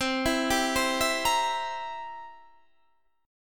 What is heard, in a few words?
C 7th C down, C7 chord; arpeggiated